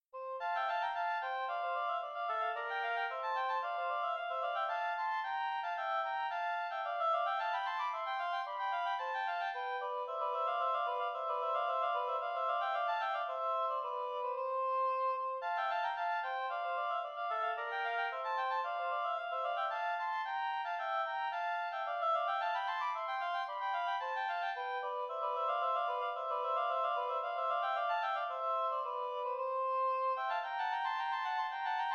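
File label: allemande oboe duet; mattheson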